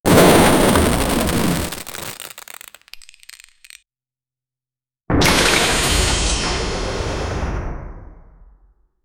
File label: explosion puzzles flying